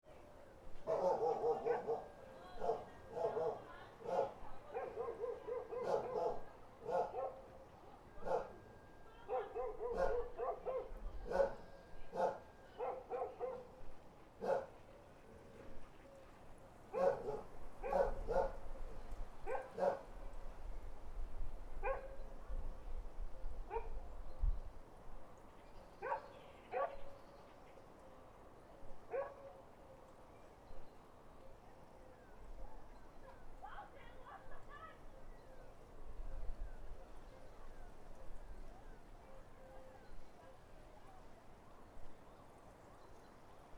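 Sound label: country dogs bark murmur birds rooster; atmosphere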